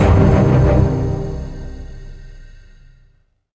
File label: Failure